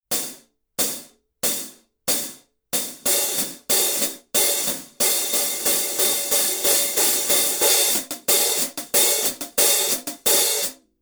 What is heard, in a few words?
2002 black 16 big beat hi-hat pattern, paiste